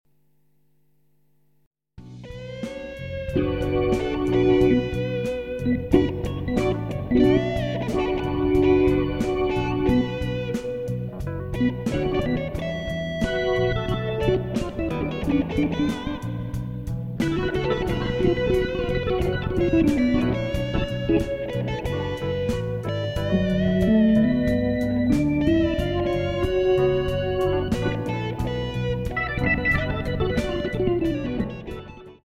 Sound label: blues